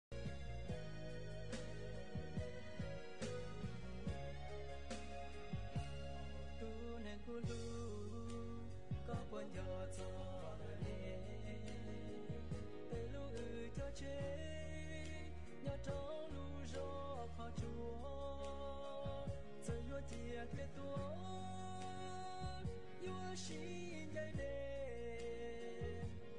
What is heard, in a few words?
hmong love song